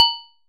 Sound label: impact Metal light